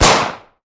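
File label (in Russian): выстрел из пистолета тьфу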